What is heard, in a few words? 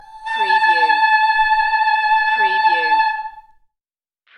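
Female Opera La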